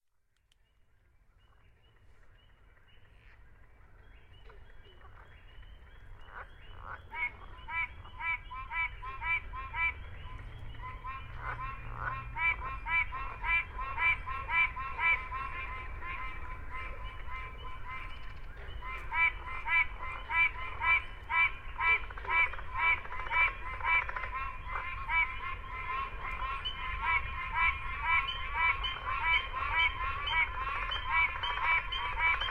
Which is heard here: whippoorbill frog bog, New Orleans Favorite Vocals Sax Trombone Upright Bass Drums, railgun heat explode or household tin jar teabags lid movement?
whippoorbill frog bog